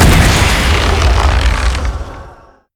bramble zone splash; Zyra

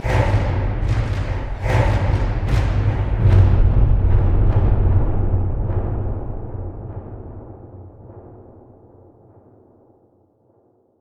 drum tra; LoL; howling abyss phase 3-4

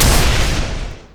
thunder claws; LoL; volibear

